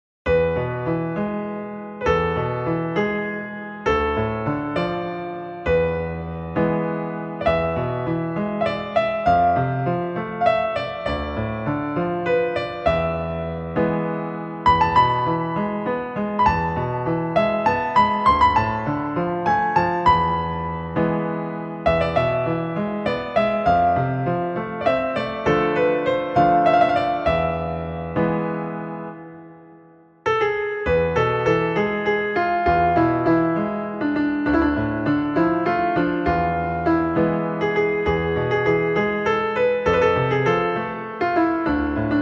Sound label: Piano